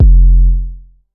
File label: bass 808 F; rhubarb; M8; Polyend; ARC Noise